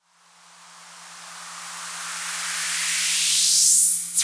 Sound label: Uplifter